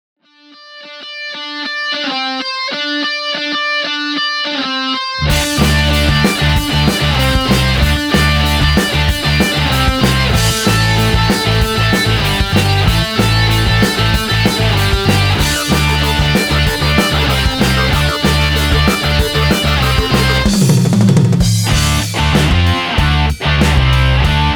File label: Fun 190bpm remix